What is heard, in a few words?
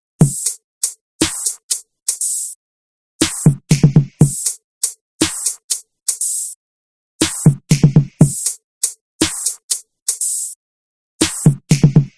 Hydraulic